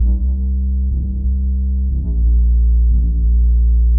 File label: bass